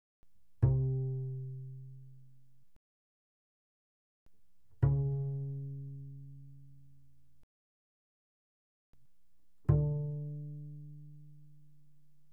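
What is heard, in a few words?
Bass pizzicato fortissimo sul D C3 B3, doublebass; Strings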